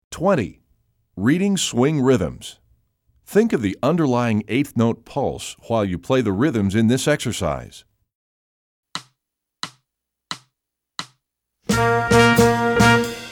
reading swing rythms

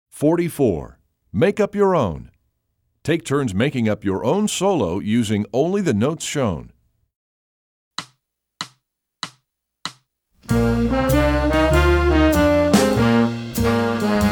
improvise 2-bar solos

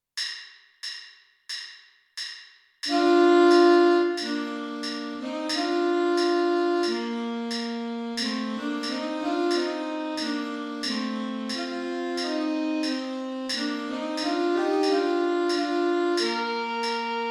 alto sax; the conquering hero

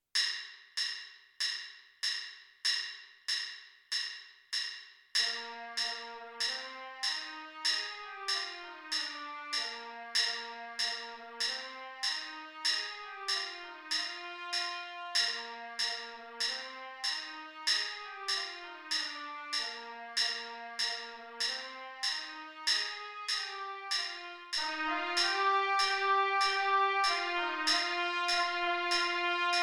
trumpet

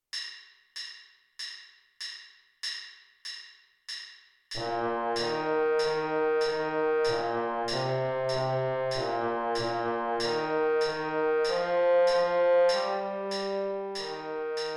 trombone

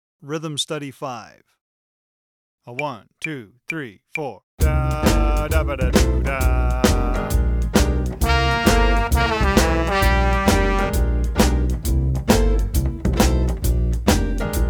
shuffle rhythm

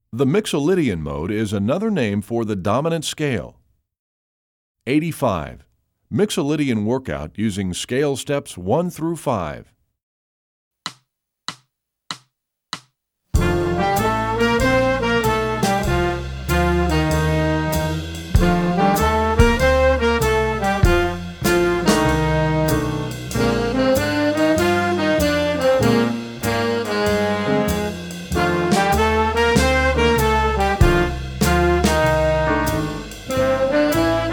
mixolydian workout scale step

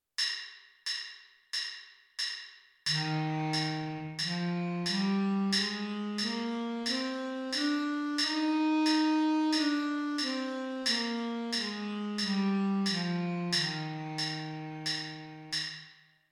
c major scale alto sax lower octave

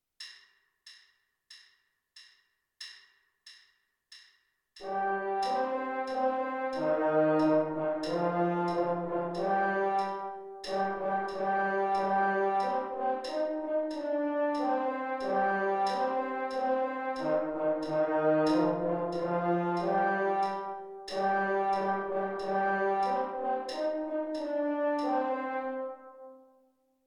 erie canal capers french horn

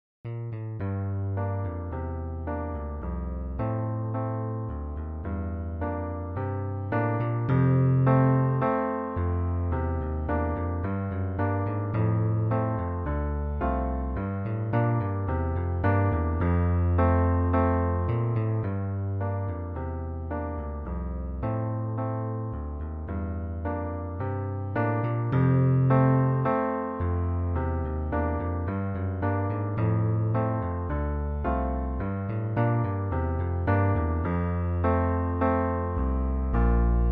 electric bass and piano

